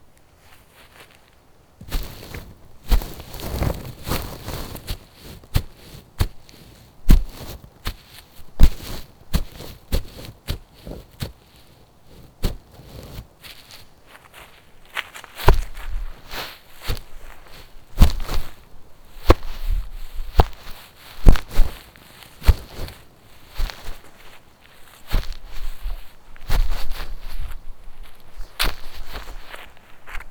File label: footsteps grass